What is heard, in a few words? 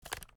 inspect move close, arms; EMXArms; umx32